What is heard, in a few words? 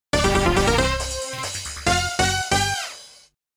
Spare Jingle